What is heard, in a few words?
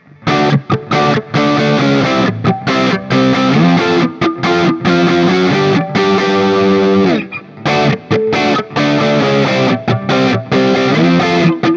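Guild Bluesbird Holoflake JCM800 A, guitar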